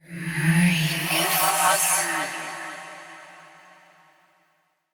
Hand Compass Vocal; Warframe; Duviri

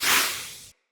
Sparrow Dive Sweetener; Animals

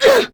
Pain, Female; Warframe; Grineer Female; Battle Barks

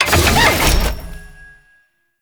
UI close; Veilbreaker; Kahl Customization

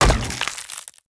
Acorn Impact Dirt; Enemy; Characters; Abilities; Mendicant; Mnd Wazard

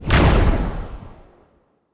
Staff Caster Cast Short Shot; Weapons; Player; Staves; Wazzard Staff Caster

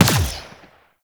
Multi Gun Fire; Enemies; Abilities; Warframe; Corpus

